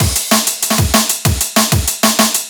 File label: amen jungle break